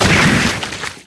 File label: Metroid Vox